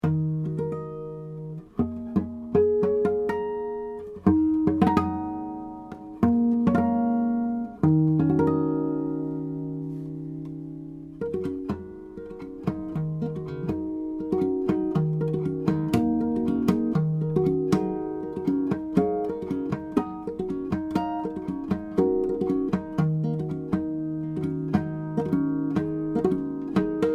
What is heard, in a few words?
Harmonics; Ramirez 1970F